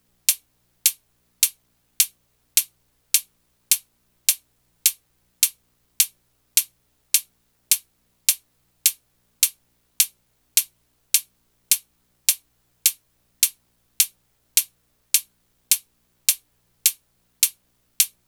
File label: hat 105, Hat Loops; Slate; SD MIDNIGHT Sample Pack